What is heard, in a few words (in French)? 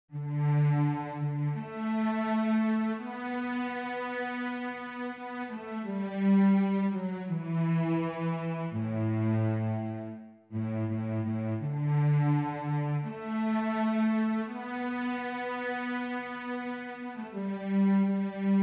VIOLONCELLE; CelesteJerusalem